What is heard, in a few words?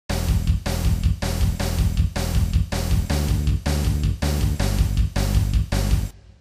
Drum Bass; MidiEdit; studiobp